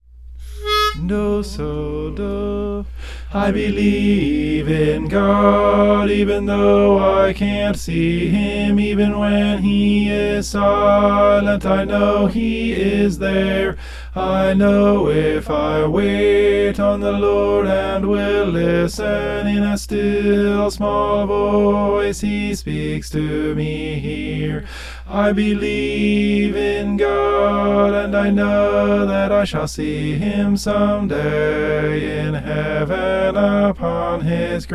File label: tenor